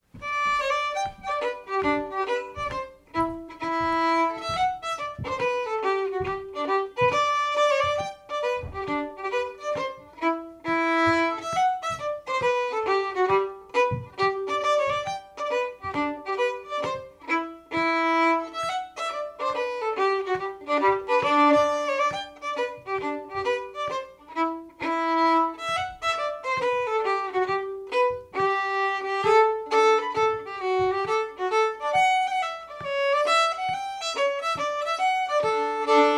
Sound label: Westmanland Schottische